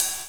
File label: Ride; Equipped Music; Loungin House